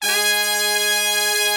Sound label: BRS, R&R Horns